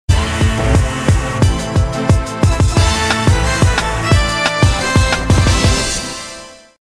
music; grand chase

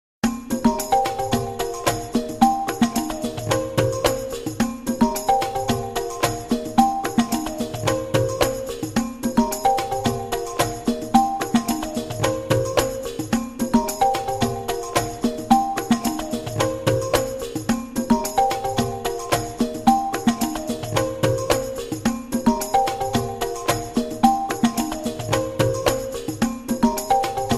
Jungle Ring

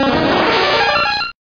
paras cry

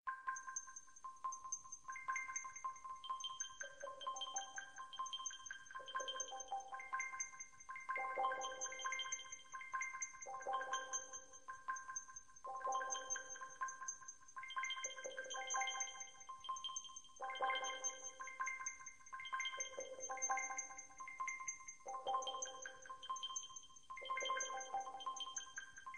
morning calm alarm, electronic

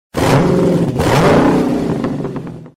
notification

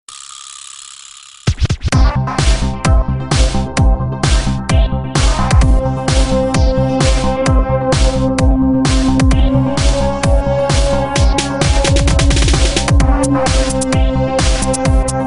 Beat House, ringtones

